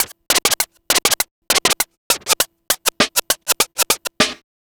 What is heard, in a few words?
Vinyl Scratch Snare